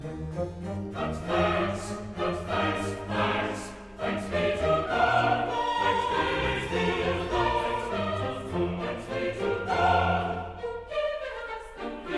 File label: but thanks be to god, chorus